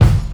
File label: Kick; Sonic Foundry (Sony Creative Software); Stylus Pressure Urban Grooves on Digital Wax